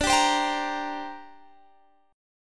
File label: D 7th Suspended 4th Sharp 5th, chord